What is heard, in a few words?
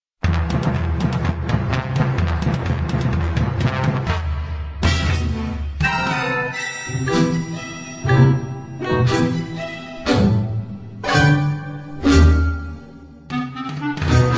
Karaoke Instrumental; Aladdin